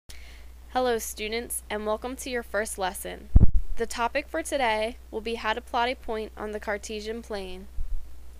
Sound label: plane